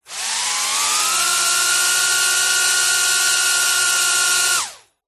drone mini propellers med burst ground; vehicles

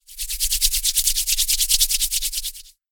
human hands rub together; zapsplat

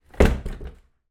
household wheel barrow down inside garage

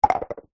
measuring cup small plastic set down wood surface; household; zapsplat

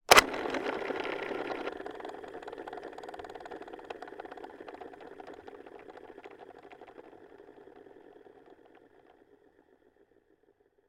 toy lever pull disc spin plastic

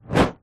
nature fire flame torch whoosh air past

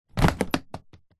luggage briefcase set down on suitcase

